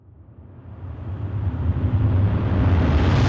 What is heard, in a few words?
Riser